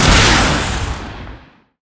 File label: Scorched Earth Shot